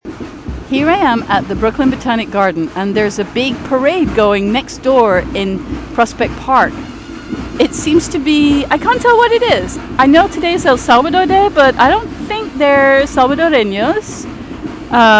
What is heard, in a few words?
drums and trumpets in prospect park